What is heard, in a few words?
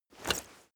leather metal movement soft single